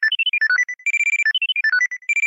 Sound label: A cricket chrips, ringtones; Samsung; Galaxy S CDMA SCH-i909